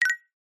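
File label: multimedia game sound mallet notify exclamation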